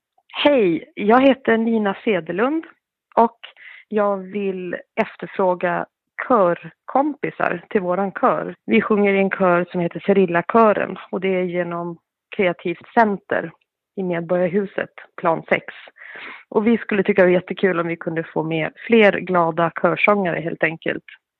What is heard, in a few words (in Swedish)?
Sjung med